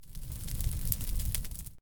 火焰燃烧声

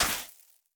可疑的沙子踩踏声

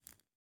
蜡烛环境声; ambient

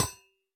铜格栅破坏声

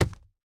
錾制书架插入声

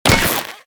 狼甲开裂声

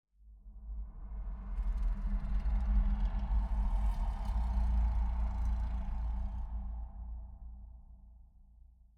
玄武岩三角洲扭曲声; ambient

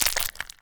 青蛙卵孵化声

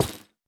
下界木悬挂告示牌破坏声

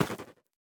菌破坏声

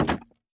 竹木活板门开关声

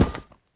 宝库踩踏声

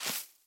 苔藓踩踏声

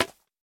竹放置声

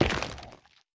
菌光体踩踏声

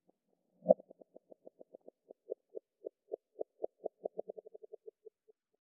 嘎枝之心待机声

